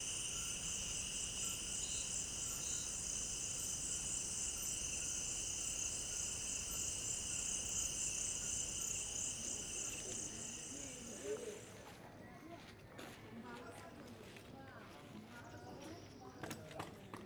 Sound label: African Village Full Library Stereo Binaural